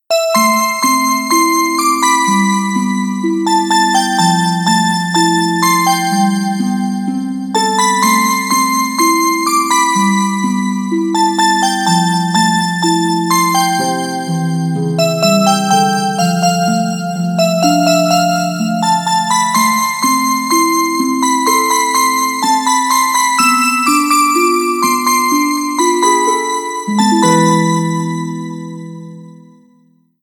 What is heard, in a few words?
RingTone